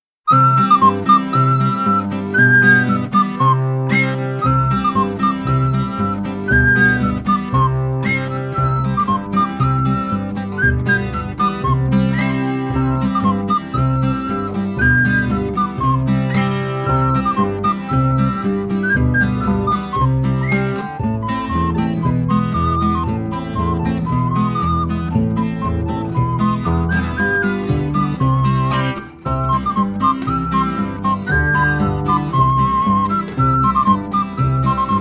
le chien aboie instrumental

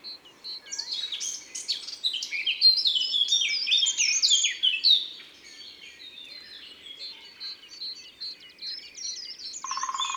Fauvette a tete noire ABC des chants d oiseaux

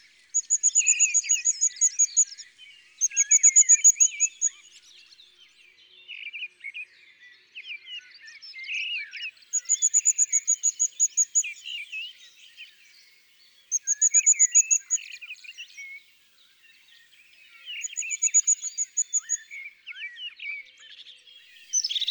Mesange huppee; ABC des chants d'oiseaux

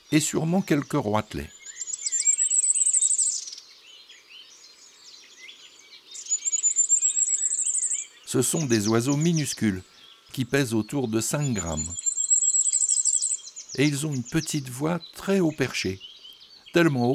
Le Roitelet triple bandeau, chants d oiseaux; Automne

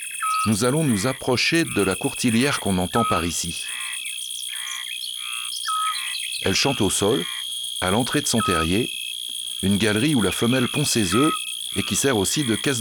Courtiliere; ABC de la nature

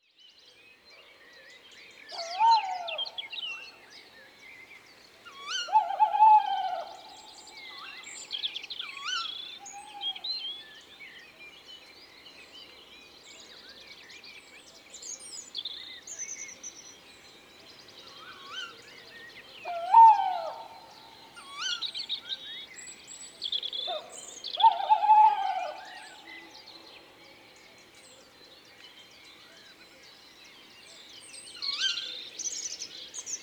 Chouette hulotte ABC des chants d'oiseaux

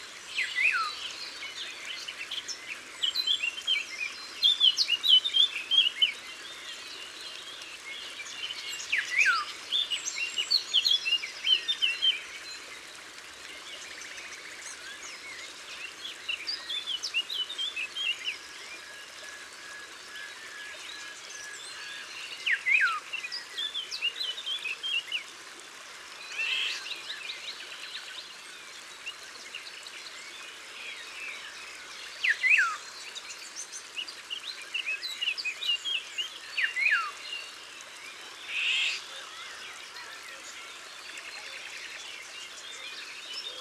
Loriot d'Europe, Concerts naturels de l'Isere; Studio Les Trois Becs

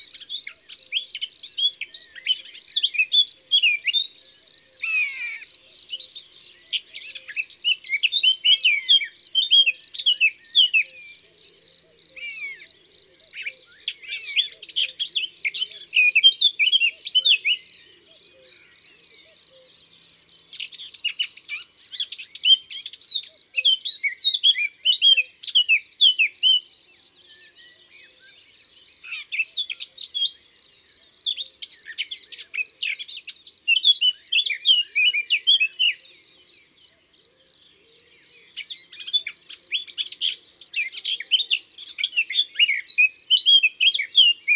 Fauvette a tete noire; abc de la nature

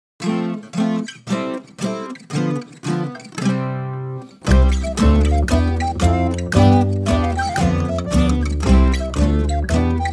Version instrumentale; Studio les Trois Becs

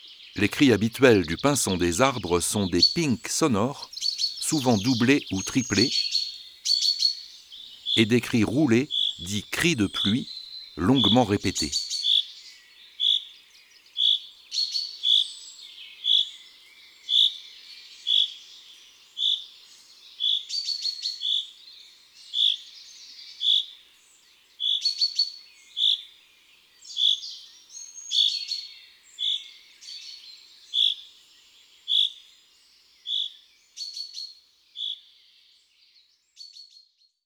Pinson des arbres

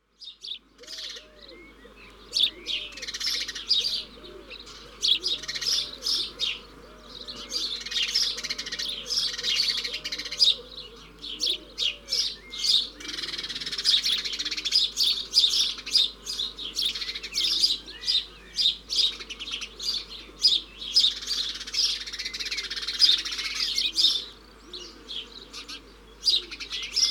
Moineau domestique ABC des chants d'oiseaux

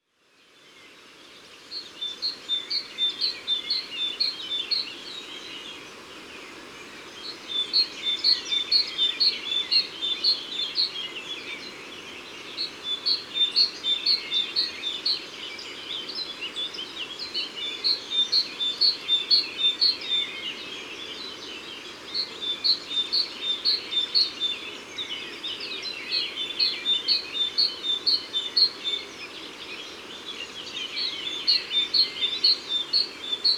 Mesange charbonniere; Isere; Concerts naturels de l'Isere; Studio Les Trois Becs

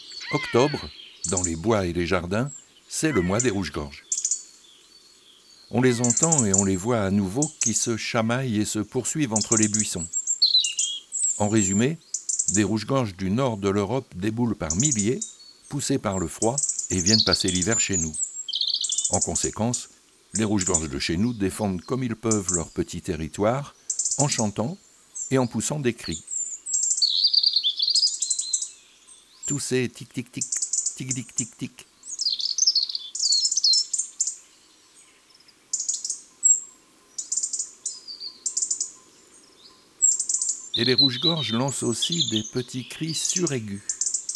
Automne Le Rougegorge, chants d'oiseaux

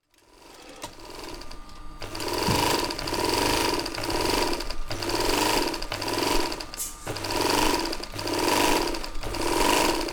Atelier de couture des couettes, Ambiances Sonores; Saint-Pierreville; Ardeche; Ardelaine; Ambiances Sonores d'Ardeche

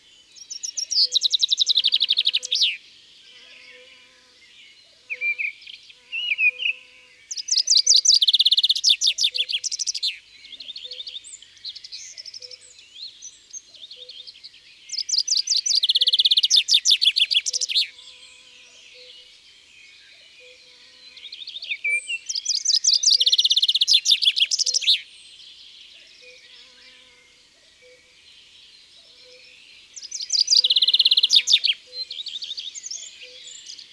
Pinson des arbres, Chants d oiseaux dans la campagne

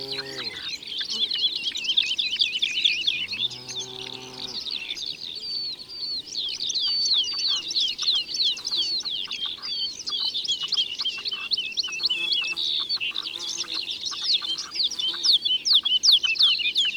Alouette Lulu; Concerts Naturels De l Ardeche

